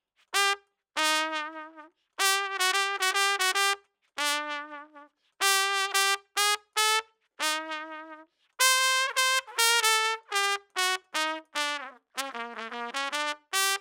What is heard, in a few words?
Trump; RE20